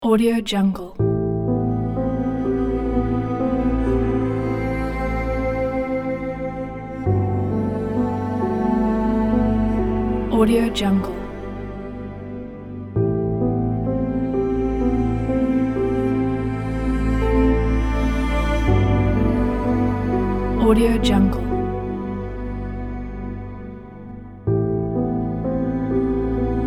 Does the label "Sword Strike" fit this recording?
No